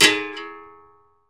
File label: STICK MUTE, Berimbau; E-MU Producer Series Vol. 2; More Studio Essentials; Composer; Berimbau Pallet